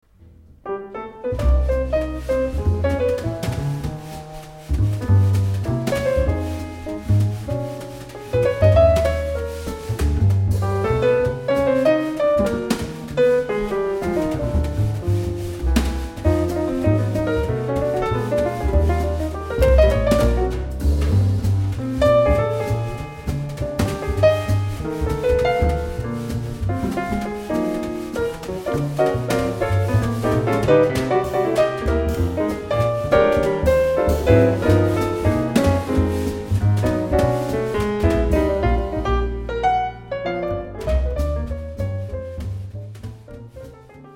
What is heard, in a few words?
jazz